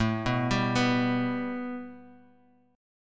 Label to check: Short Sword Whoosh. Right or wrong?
Wrong